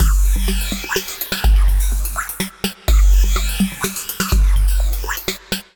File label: Americana Drum Rhythm